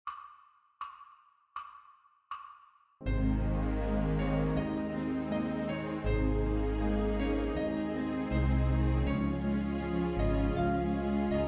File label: All Together in Concert Ab